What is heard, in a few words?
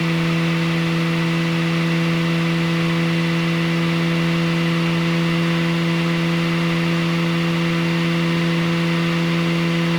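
Mazda RX-8 231HP RENESIS - Onboard - Designed - 5k RPM loop; Soundholder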